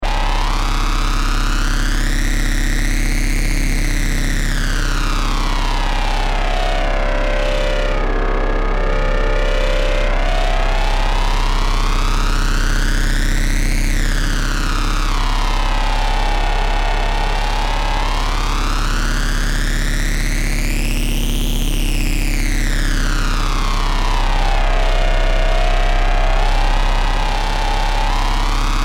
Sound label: Metallic Drone, Harsh metallic Industrial drone to make your teeth rattle.; Sound Design